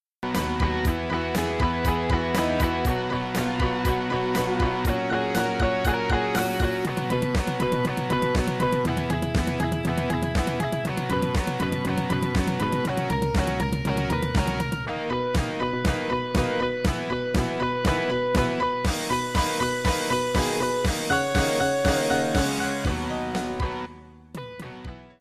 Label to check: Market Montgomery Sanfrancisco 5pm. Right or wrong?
Wrong